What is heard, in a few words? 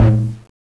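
Door click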